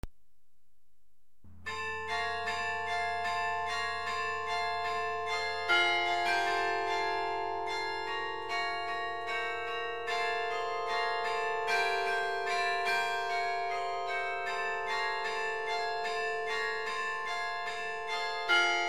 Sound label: jingle bells, carillon